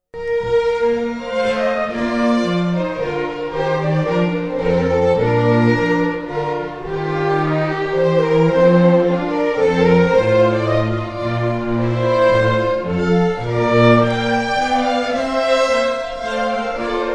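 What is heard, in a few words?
Minuet, Scottish Fiddling; Lady Helenora Home's Minuet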